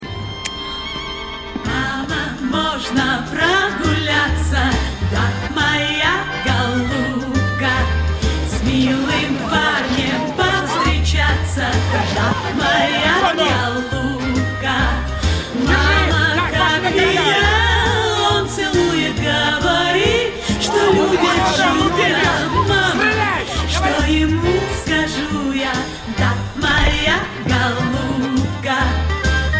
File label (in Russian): Oдесские песни; Клезмерская музыка; Клезмер сегодня